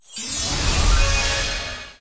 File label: random Symbol Transform; fairyhansel